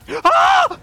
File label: ahh; matoro